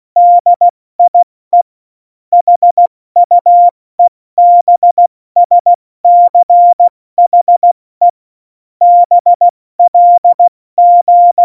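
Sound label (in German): Witz, Tempo 70, Morsekurs, cw morsen; Witze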